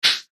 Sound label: button high tech; Multimedia